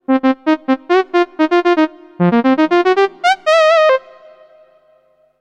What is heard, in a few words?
classic saw